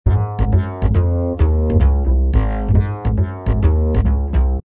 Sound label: Upright Cookin Ab; Lounge & Reggae; Loopology; Be Bop Baby